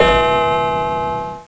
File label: CLOCK; striking clock bell